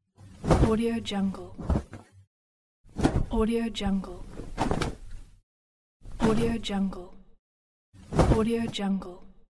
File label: shaking clothes sounds